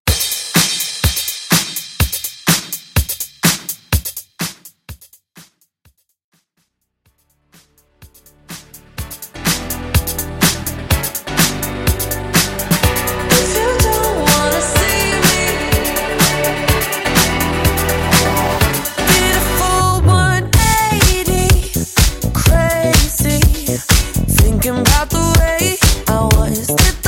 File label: House RMX Club Mix